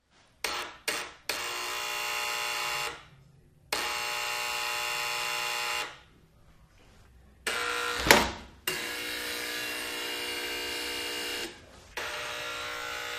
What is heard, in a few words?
Apartment Door Buzzer, Medium Close Up. A Few Short Buzzes, Then Buzz And Open Door Twice With Change Of Timbre On Open.